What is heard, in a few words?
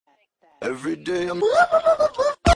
woop woop woop woop